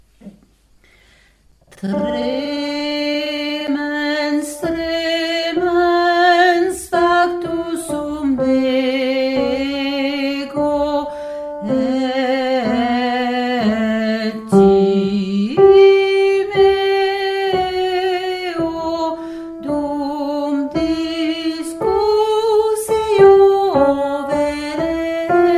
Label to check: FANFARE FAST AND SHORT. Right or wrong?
Wrong